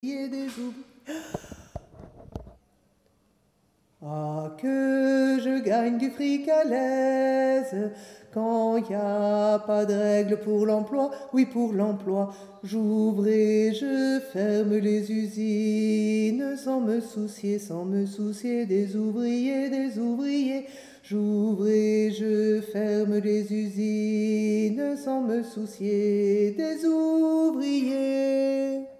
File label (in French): tenor